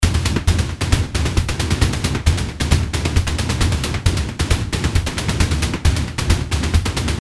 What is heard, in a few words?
loop; poppf drumseratops cursed